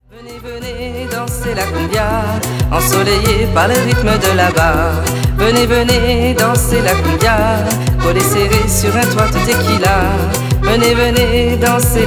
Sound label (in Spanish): cumbia